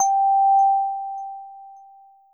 end chime

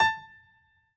piano hi

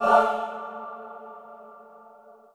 choir

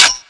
gun

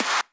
Clap; osu

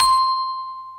N Bell C6

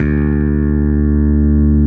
bass

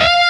bend full

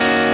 x 6 5 7 5 Dm7

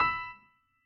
piano

